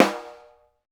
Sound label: snare, Roland; LA Composer